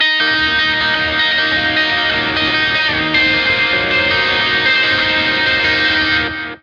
Lake Hi Gtr E flat 9; torchlight iii; ps4

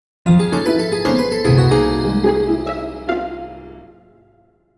mission start; World's End Syndrome

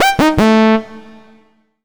Solo Clean Sawtooth Negative; MUSIC EFFECT; startide

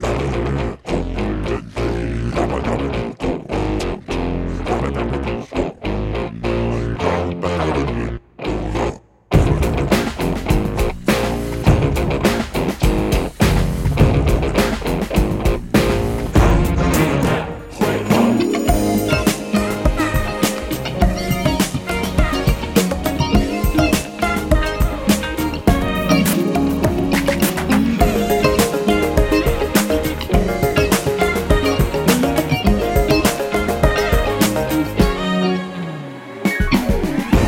Funky; Porkcini; angry birds stella pop android ios gamerip 2014

